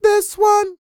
SING, Vocal Planet R&B & Dance; ILIO

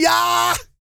YELL, Vocal Planet World Voices; ILIO; Vocal Planet VOL-5

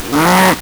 LSDj Animals, Nintendo Gameboy; 300 Drum Machines

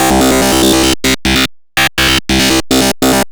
GRUNGE

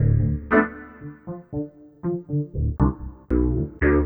Smooth, Bass; Sonic Foundry (Sony Creative Software); Synchro Funk

